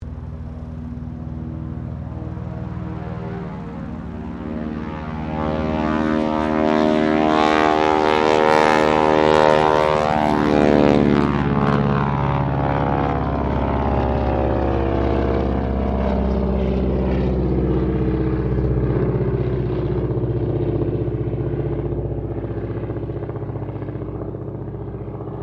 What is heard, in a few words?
Aircraft AT 6D Harvard Mk III takeoff, American military training aircraft; Airplanes